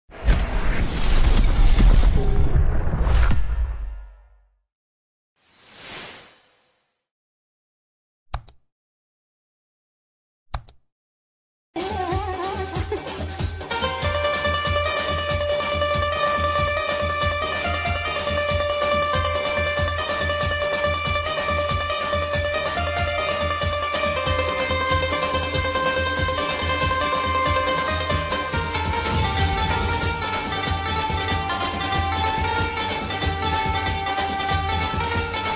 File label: ringtone instrumental songs banjo cover